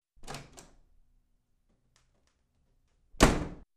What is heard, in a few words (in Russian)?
Дверь от туалета открыли и закрыли The door from the toilet was opened and close